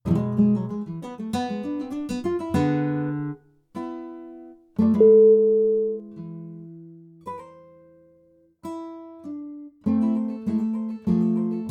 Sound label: classical guitars duo